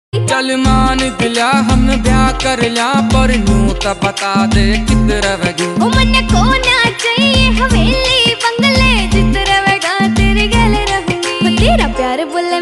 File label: haryanvi ringtone